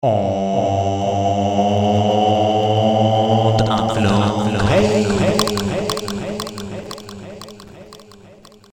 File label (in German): Jingels